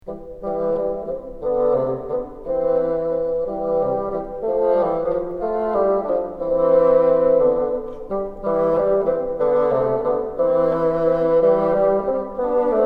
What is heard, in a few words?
together, bassoon